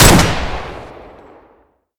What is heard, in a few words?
pistol fire; deluge; weapons v3